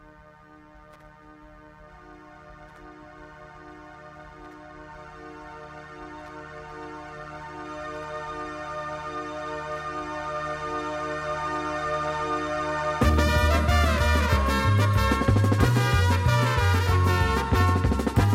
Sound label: Waterfall